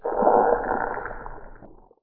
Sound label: damage; Barotrauma; Barotraumatic; Coelanth